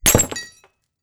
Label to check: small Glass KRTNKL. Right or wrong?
Right